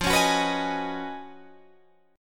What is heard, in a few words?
Db 11th F, guitar